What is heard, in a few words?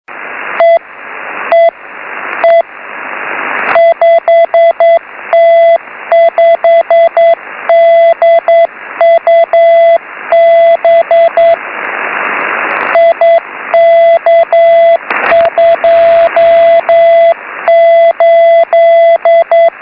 beacon, 6m; 5t5dub